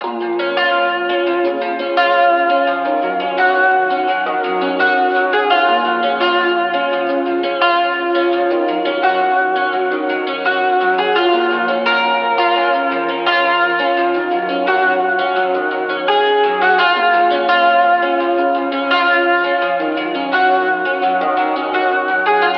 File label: songstarter 85 Bbm; Slate; SD PASADENA Pop Sample Pack